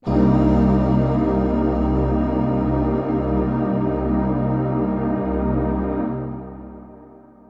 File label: SYNTHPAD AMBNT 160 C; Ambient; Sphere